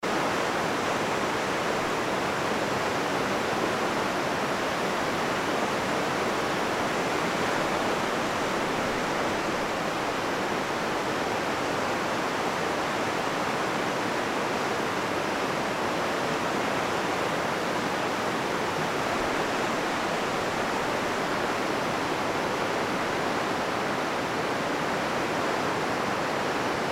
river

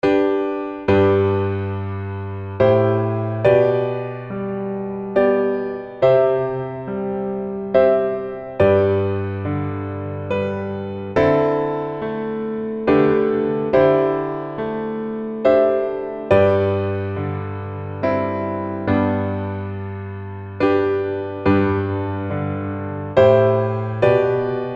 Aogeba Totoshi piano

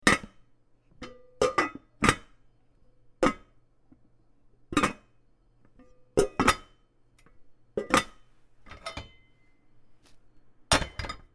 pot lid

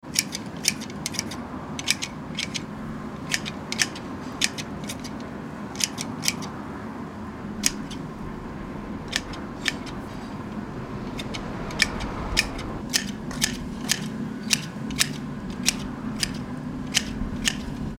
mowing

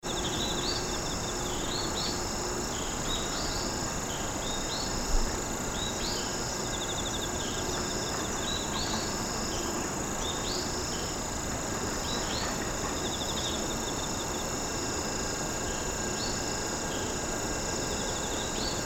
old folk house

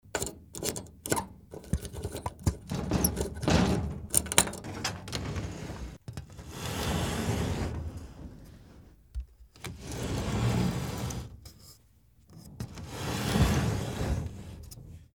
window plus key

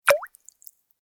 water drop pochan

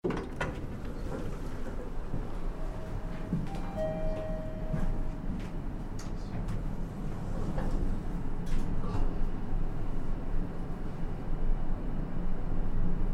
elevator

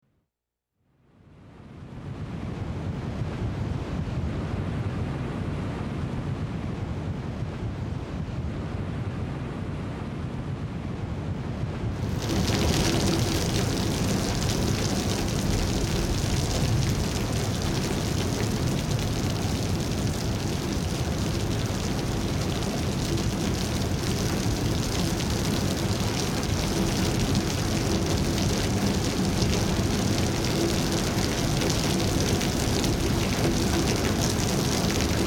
japan storm

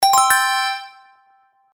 kirarin

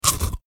eat ice candy

